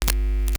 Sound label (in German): KNISTER, NOISE; Xsample VOL-9